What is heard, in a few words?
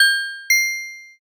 shop enter